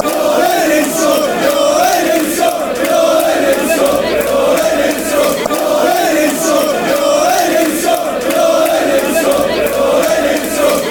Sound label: we all hate leeds scum, fanchants; trfc